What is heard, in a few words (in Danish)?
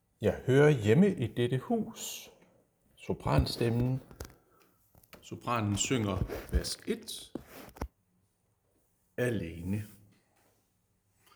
sopran; harlev koret